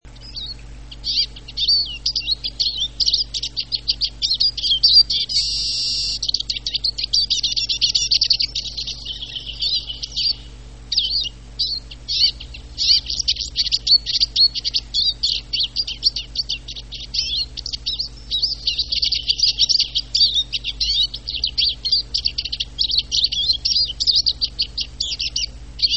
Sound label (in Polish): czyż i zięba